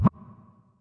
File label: scrub, Yamaha Tenori-On; Scrubkit